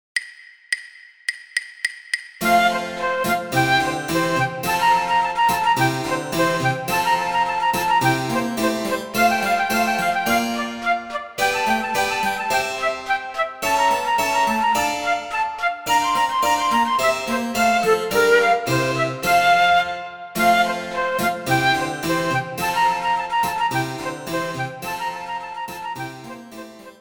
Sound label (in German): Sonate F Dur Ausschnitt; Vivaldi